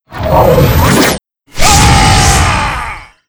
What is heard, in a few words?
Windy Giant Skill